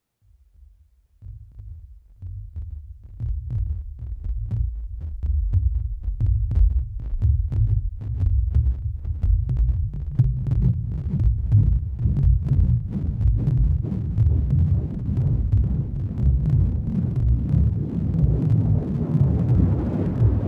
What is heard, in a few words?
Particle Drum